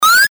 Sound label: jump